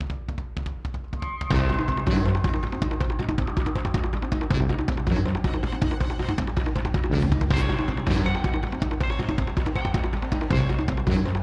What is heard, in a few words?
The Dungeon Suspense 160